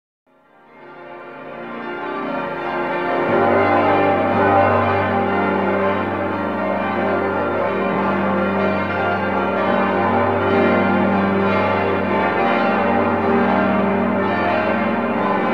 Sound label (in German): Glockengeläute des Domes zu Würzburg - Stille Nacht, heilige Nacht